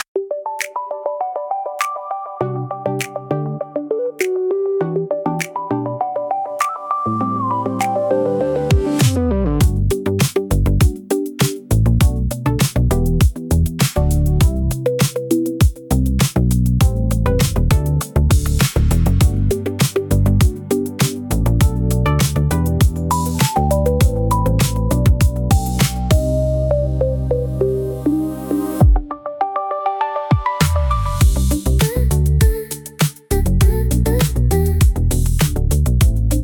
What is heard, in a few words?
Glass Table Morning, soft electro for scruffy modern vlogs